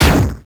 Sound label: Super Weapon Bomber Explosion